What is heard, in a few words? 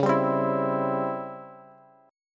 Db Minor Major 7th Flat 5th Db down Bass; DbmM7b5 strummed; JGuitar